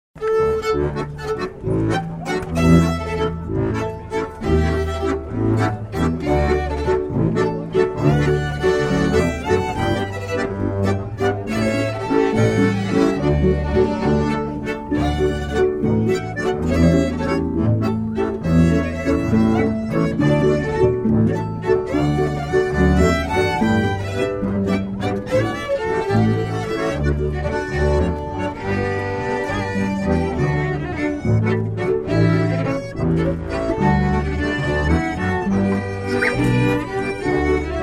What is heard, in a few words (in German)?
Forellenwalzer; Kirbe Sep2010; Schafstall